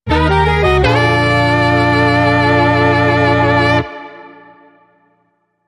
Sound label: Late Night TV